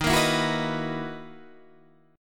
B Major 11th Eb, guitar

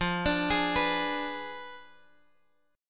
F Diminished 7th F down Guitar DADGAD

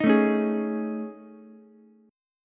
A Major 7th Flat 5th, Ukulele; strummed

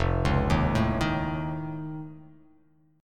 F Augmented 7th F

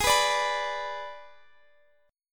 Ab Diminished Ab Guitar Open F; strummed; x,x,20,18,17,18

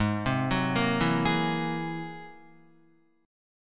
G sharp Augmented 9th, Guitar; arpeggiated; 3,3,6,3,4,4; 4F,4A,5C,5G,5C,6E; JGuitar

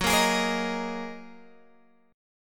F sharp 6th Add 9th F sharp Guitar Open G

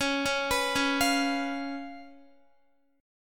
Db 7th Db down Guitar; Db7 arpeggiated; tuning=4F,4A,5C,5G,5C,6E

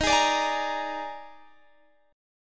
D sharp Major 7th Suspended 4th, Guitar, Standard; strummed; capo 3; x,18,18,19,21,18

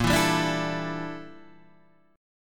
G Minor 6th Add 9th Bb

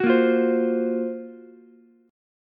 F sharp Major Sharp 11th F sharp Ukulele C6; strummed; JGuitar

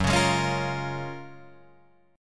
Gb Major 9th, down, Guitar, Drop D

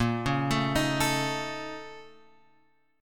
A sharp Minor Major 11th, A sharp; {6 4 7 X 4 5} arpeggiated

